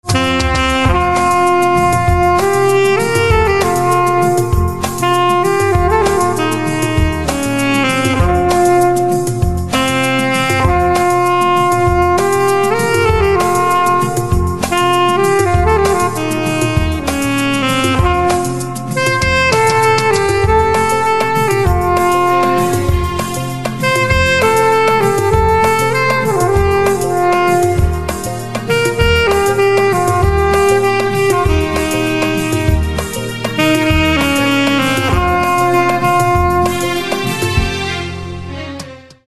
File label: Saxophone